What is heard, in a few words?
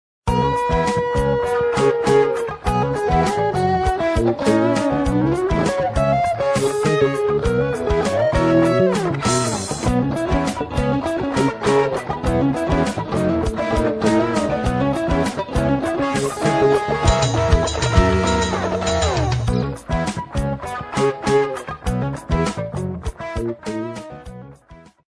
walking bass; TubeScreamer